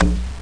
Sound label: button bounce; Interactive Tour of Olympic Museum